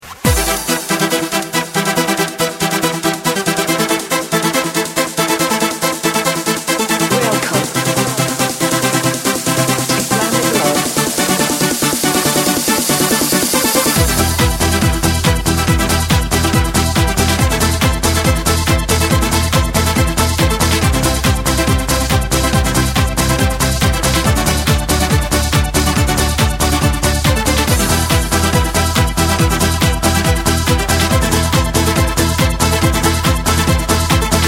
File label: Techno Intro; D Player; Windows